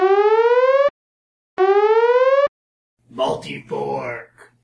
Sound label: multiball, pinball sound effects